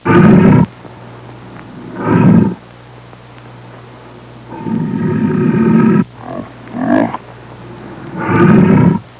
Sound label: Lion snarling